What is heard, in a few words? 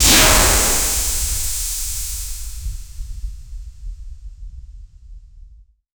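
powerful energy beam impact, continuous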